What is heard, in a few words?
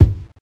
nice kick single shot g key